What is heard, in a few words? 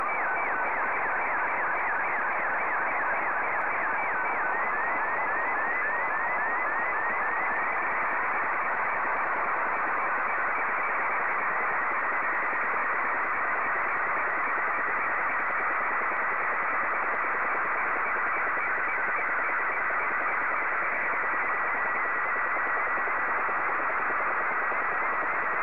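Scottie 2, slow-scan television; ZL1MVO; SSTV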